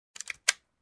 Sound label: Reloading pistol